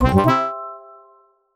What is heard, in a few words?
New Item Pickup; Underhero